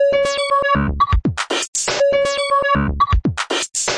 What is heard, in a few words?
attention deficit midi, 120; SYNTH AND ELECTRONIC LOOPS